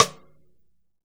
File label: STICK, Drum 1 Dry; East Collexion